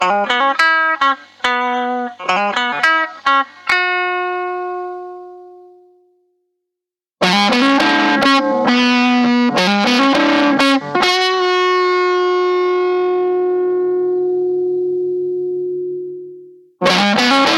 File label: blender lp bridge lead; VHT; Lead Bridge Sounds [No pedal, Benderness 0%, 50%, 100%]